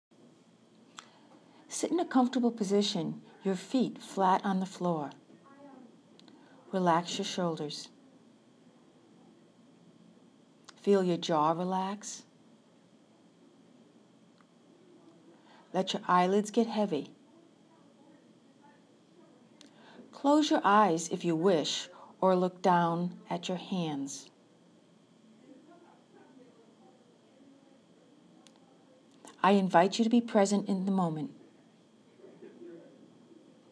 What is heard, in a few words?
chime; brain break